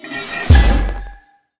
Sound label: bonus coin fly; silverback